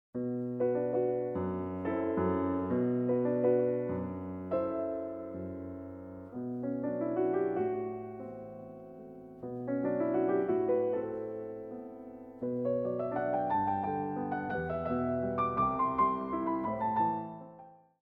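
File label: Waltz, piano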